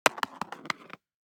DICE on Cardboard, Throw and Roll, Standard, 1 One Die; Samples; ArticulatedSounds; DICE Sfx Party (Extended)